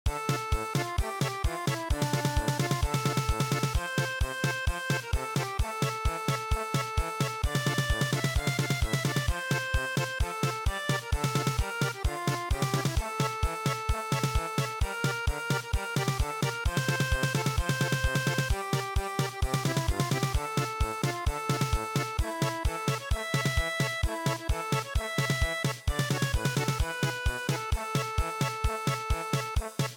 German Polka

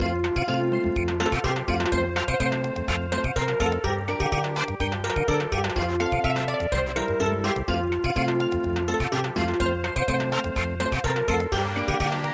Samba

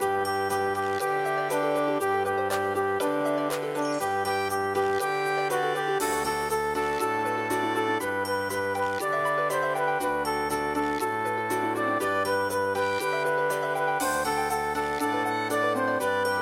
nursery rhyme, midi; there was a crooked man

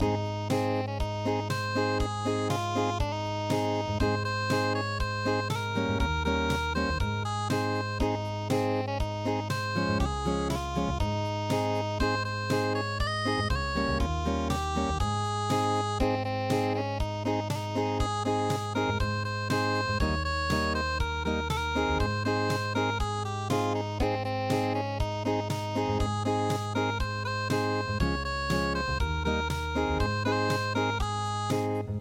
unpluged, midi; o makiba

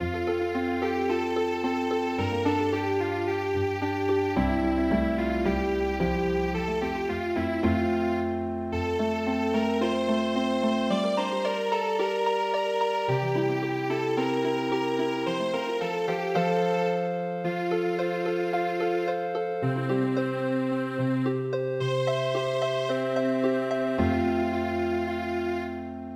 Grove Morning Healing Harp

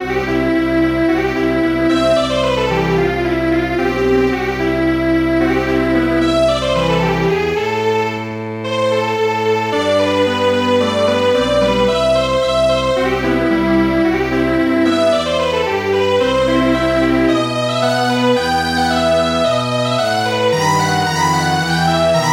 Turkischer Marsch Strings Quartet

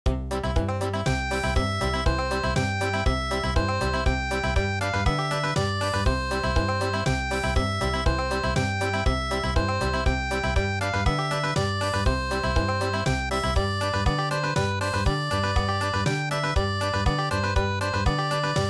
Country

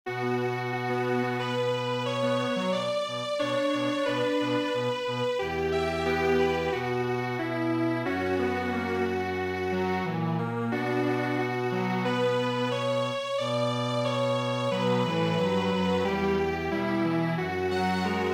Strings Quartet; Ruined Castle Moon

